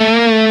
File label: bend half release